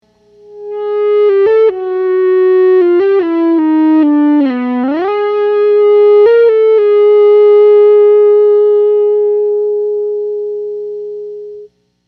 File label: EBow